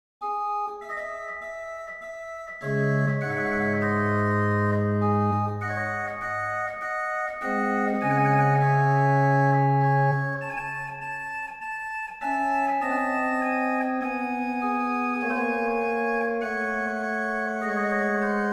Magnificat Dialogue, organ; Dandrieu; roquemaure

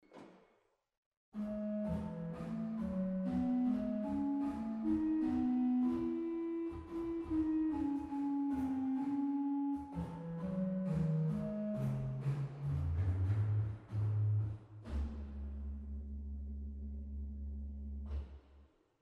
Portun Bass 8, organ; waltershausen

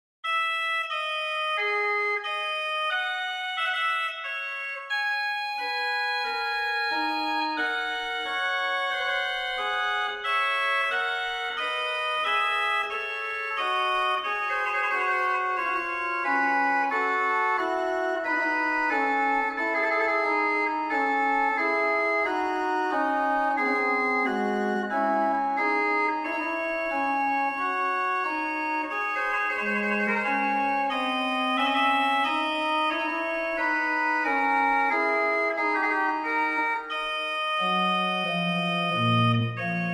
Magnificat Duett, organ; Dandrieu; roquemaure

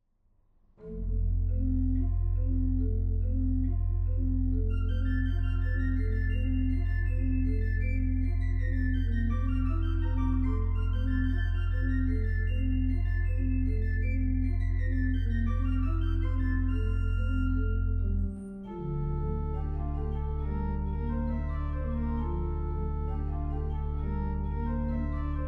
Verset, organ; goenn; 1844 Franz Xaver Engelfried Organ; Lefebure Wely; L’Organiste Moderne. Collection de Morceaux d’Orgue dans tous les Genres, op.122

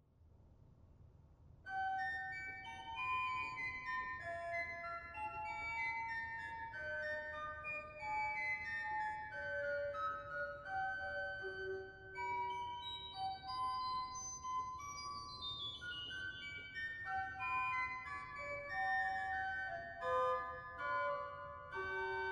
Piccolo; dortmund; Sauer

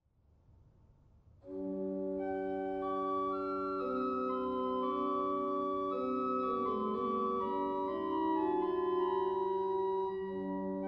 Flute Harmonique 8, organ; dortmund; Sauer

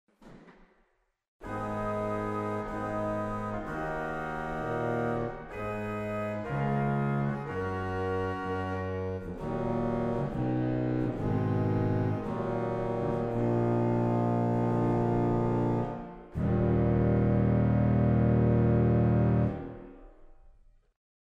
Fagott 16, organ; waltershausen

